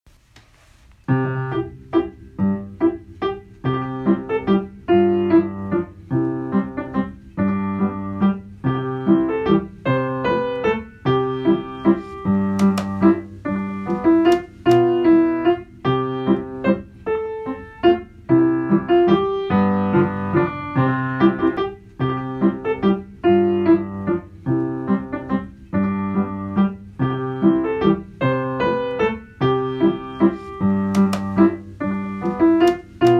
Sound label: Piano Track; LUCKY STIFF; Flint Repertory Theatre